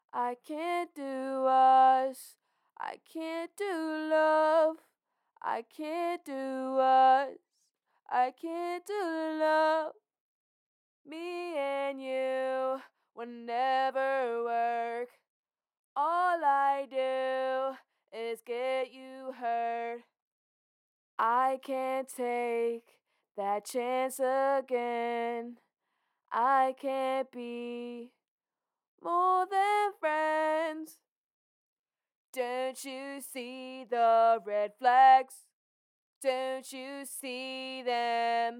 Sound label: acapella, Pop Rock HH 75 Cm